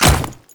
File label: Grineer Royal Guard Ice Step, Footsteps